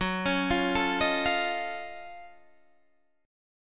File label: F sharp Minor Major 13th, Guitar, Open D